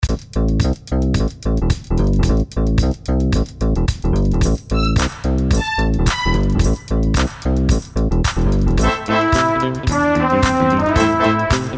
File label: disco